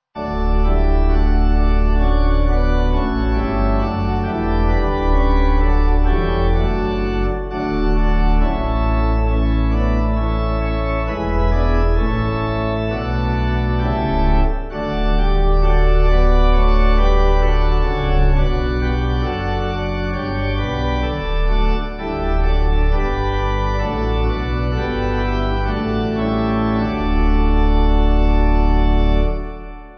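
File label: Pipe, Pipe Organ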